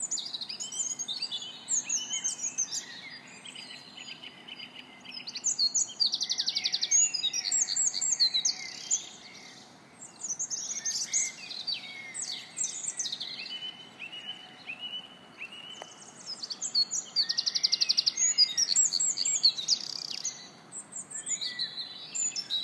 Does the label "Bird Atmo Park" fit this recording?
Yes